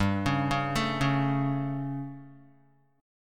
G Suspended 2nd Flat 5th chord, Guitar; Gsus2b5 arpeggiated; JGuitar

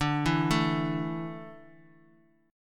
D Suspended 2nd D down

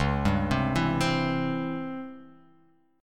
Db Minor Major 7th Flat 5th Db down; DbmM7b5 arpeggiated; JGuitar

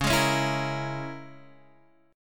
Db Minor 6th Db down Guitar Standard; Dbm6 strummed; JGuitar

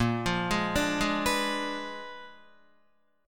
Bb 7th Flat 9th Bb, Guitar; Bb7b9; arpeggiated; JGuitar

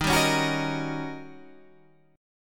D sharp 7th Sharp 9th Guitar Open G

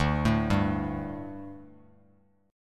D Suspended 4th D, down, Bass Standard; arpeggiated

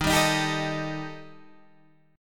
Eb Major Flat 5th Eb Guitar Standard; EbMb5 strummed; 11,10,13,12,10,11; JGuitar

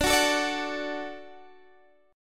D-Major, D, Guitar, Standard; strummed; JGuitar

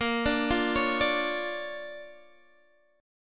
D Minor Major 7th Sharp 5th, A sharp

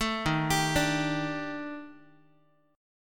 D sharp Major 7th Flat 5th, down, Guitar; D#M7b5; arpeggiated

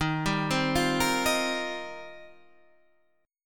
Eb 6th Add 9th, Guitar, Half Step Down

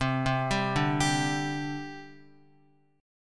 C Suspended 2nd, C, down, Guitar; Csus2 arpeggiated

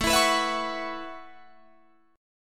A 6th Add 9th, Guitar, Drop D

